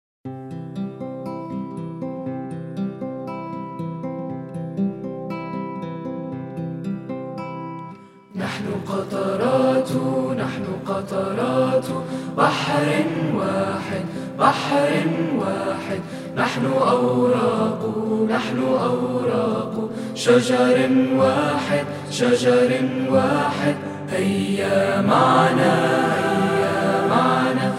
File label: Song, AR